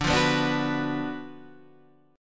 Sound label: F sharp Major 7th Suspended 4th Sharp 5th D